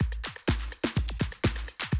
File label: 125, Classic Drum Loops